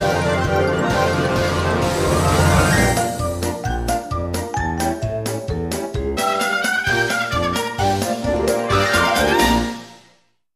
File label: cartoon city